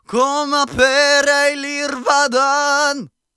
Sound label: Battle Cry, Viking Vocal, Un-Processed